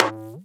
Percussions

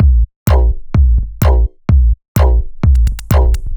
Radio C# 127bpm, House Drumloops; 90 s Sample CDs; Zero-G House Fabrik Drumloops; ZG Drumloops

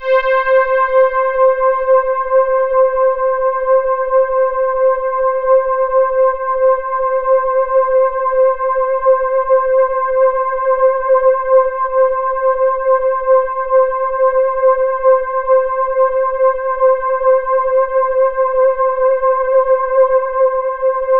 C5, Synth Line; 90 s Sample CDs; NiceBeats; SynthLine VOL-18

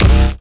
Basses Root C

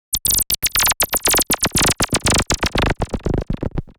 Gated sweep (120BPM); musicradar

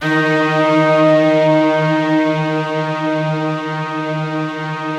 BIG ORCHESTR, D#2; Yamaha VP-1; Music Store; 90's Sample CDs

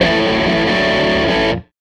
Les Guitar Loop

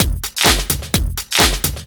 Straight Loop 128 BPM, House; Best Service; House Definition VOL-1

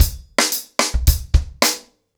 110BPM, ACOUSTIC DRUMS

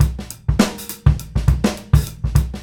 GROOVE; E-MU Producer Series Vol. 8 – Platinum Phatt; Grooves 99-132bpm

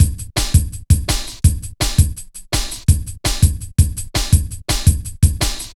Drum Loops, Jungle; Zero-G Jungle Warfare 1, 2 & 3; Cha 166.5

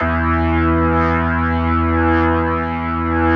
E2S, B3, Vintage Keyboards

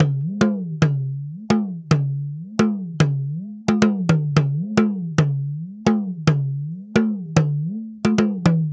Talking Drum, African

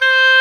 C5 SFT STR, OBOE; Northstar - New Gold VOL-2

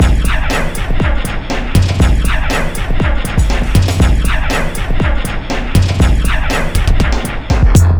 Processed Beat, Drums & Percussion

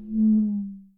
Conga, Percussion, Yamaha DTXpress

